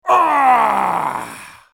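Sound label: sounds of human suffering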